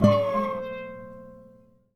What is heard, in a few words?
Classical Guitar Extended Technique Plink